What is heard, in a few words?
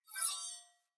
Sword Whoosh Accent Long; Weapons; Player; Swords; Fey Sword